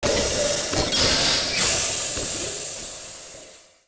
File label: Pressure; In the Line of Duty Firefighter